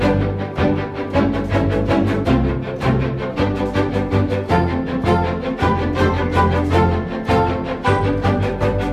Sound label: music loop; Candy Crush Friends; sugar crush